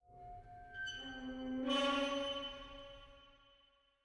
ambience sound; netent

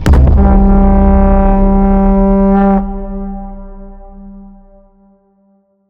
free Spin Intro Shown

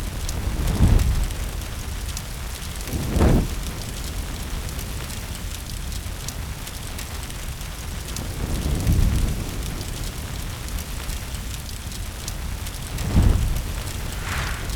fs Volcano Ambience; netent

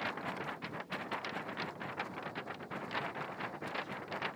reel spin; netent

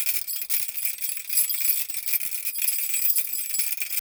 re spin reel spin; netent

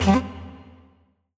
Button Spin; netent

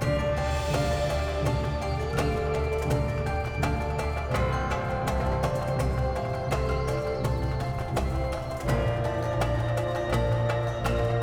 Ambi Freespin; netent

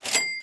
cash register up; netent

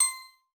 counter

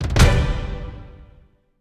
small Win; netent

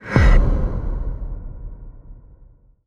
ghost pool; netent

